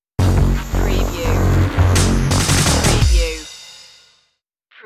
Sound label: lost in space stinger